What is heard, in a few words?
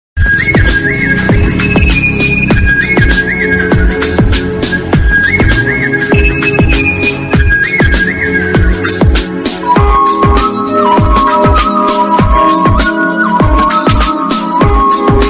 bike bgm ringtone; polladhavan